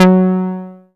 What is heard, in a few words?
Rubber Bass; Best Service Gigapack 2